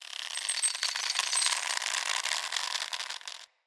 Under City Chain Rope Basket Creak; Ambience

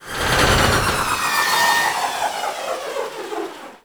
Tram Slow; Warframe; Ambience; Grineer; Grn Tram Connector Curved

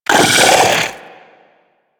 Arachnoid Wraith Vocal Attack Short

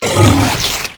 Flying Mouth Attack Inhale End; Enemies; Warframe; ManInTheWall

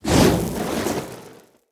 Torch Whoosh; Weapons; Enemy; Torches; UC Torch

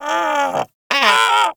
Sea Bird Vocal Idle; Characters; Warframe; Ostron; Hunting Critters

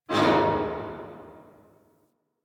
Parking Lot Metal Door Distant

